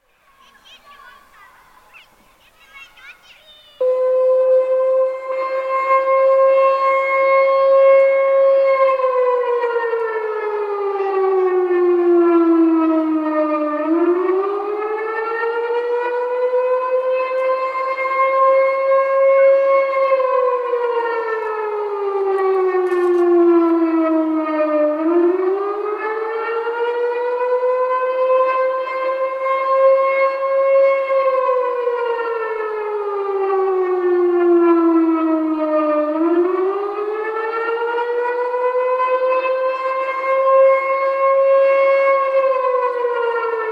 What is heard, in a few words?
sirenos, visagino